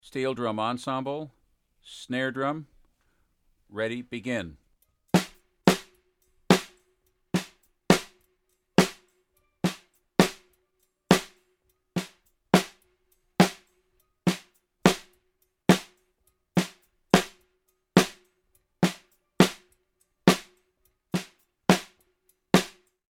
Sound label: Snare drum